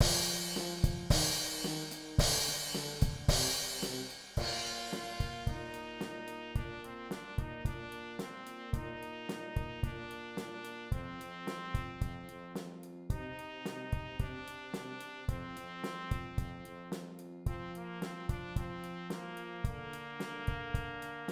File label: perc